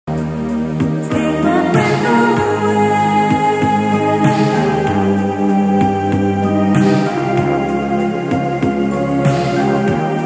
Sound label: Ringtone